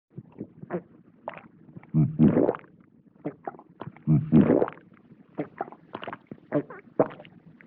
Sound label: Human Swallow; Vintage Cartoon; Comedy Vocals